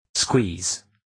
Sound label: squeeze